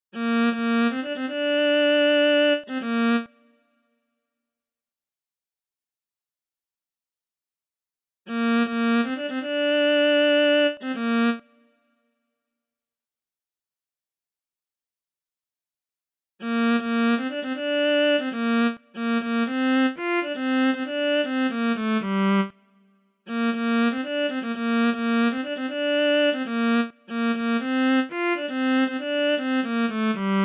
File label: Viola